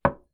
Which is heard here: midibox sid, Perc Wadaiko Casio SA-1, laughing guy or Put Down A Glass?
Put Down A Glass